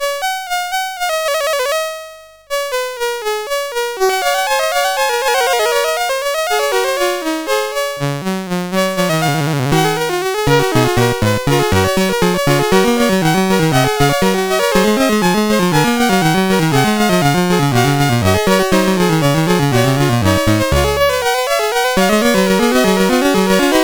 Fugue No 1, CSG8580R5

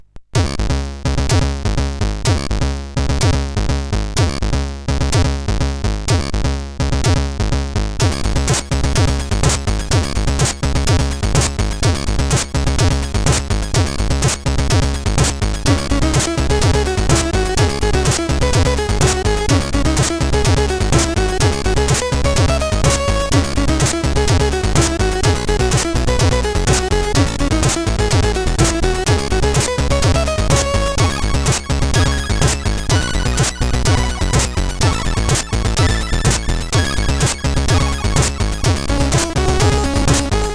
Frogsystem sid CSG8580R5

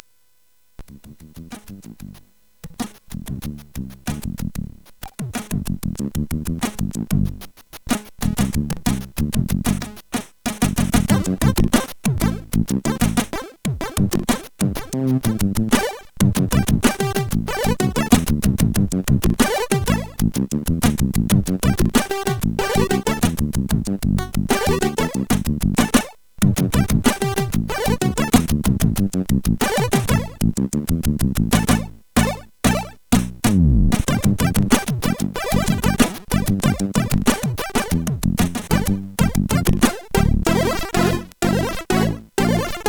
Party Jam, CSG8580R5; hvsc

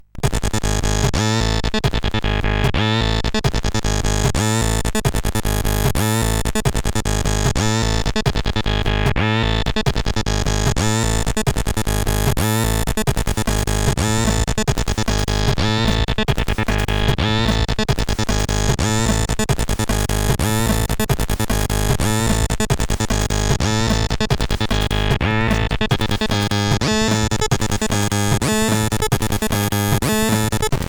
Intro Tune, CSG8580R5; Stab

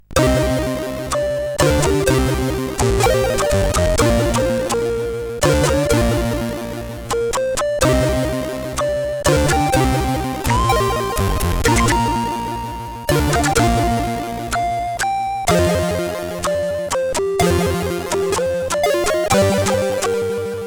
Pan Fluit, sid CSG8580R5

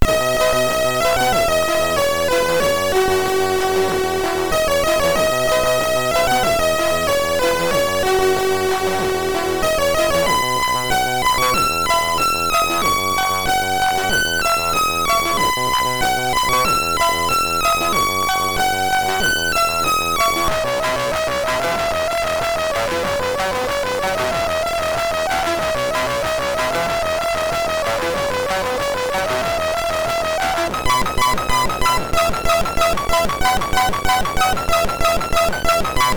Happy, MOS6581R3

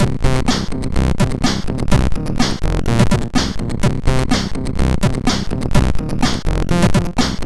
Bassloop, MOS6581R2

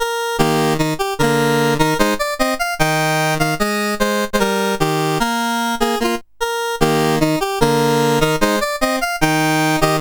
menus, sid CSG8580R5; Games Summer Edition

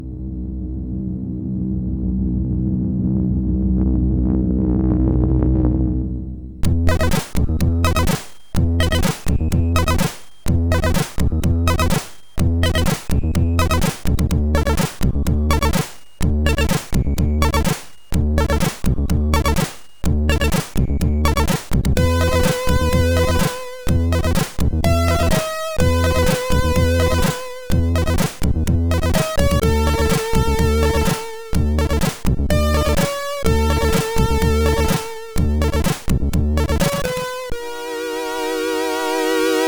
sid MOS6581R2; Im Crazy

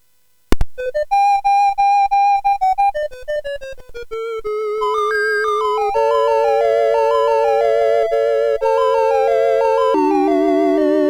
sid, CSG8580R5; Utter Ego Demo

